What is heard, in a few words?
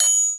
Triangle, percussions; drums